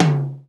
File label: Old Skool House Perc